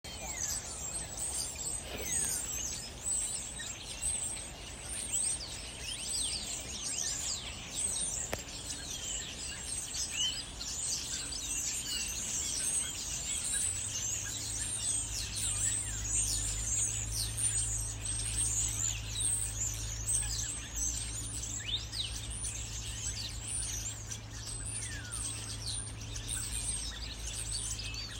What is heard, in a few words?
Starlings, bird song